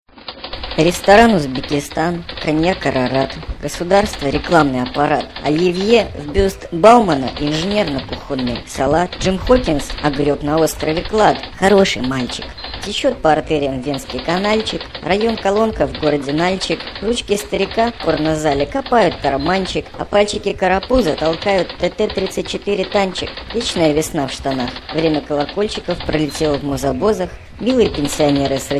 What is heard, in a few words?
ska